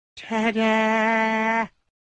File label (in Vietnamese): Tieng tada